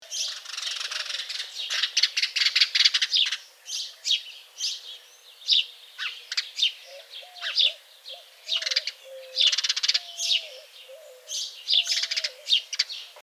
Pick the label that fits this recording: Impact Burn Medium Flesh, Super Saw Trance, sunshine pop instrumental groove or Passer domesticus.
Passer domesticus